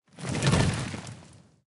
armor; smite; geb

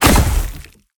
impact; smite; Anhur

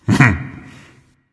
heh heh, voice; Smite; ymir skin12

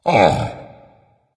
sigh dragon; fafnir

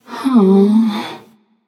sigh passion; chaac

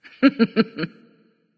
heh heh; smite; neith

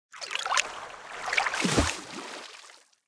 idle water; smite; god merlin

